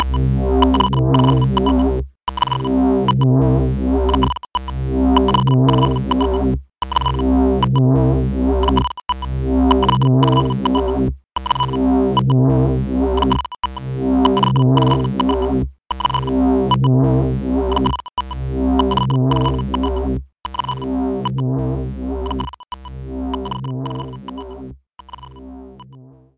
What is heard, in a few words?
Sounds Inside the Ship